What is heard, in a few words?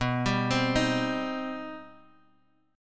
C Minor B; arpeggiated; JGuitar